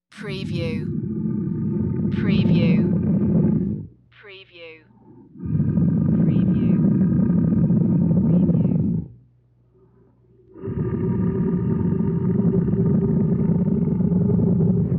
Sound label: Tiger Growls Deep